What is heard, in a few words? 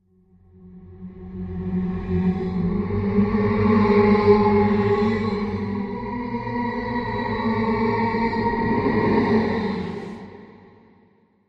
Amb Eerie Tonal Muff; drones & tones